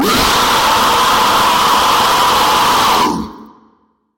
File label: Screamer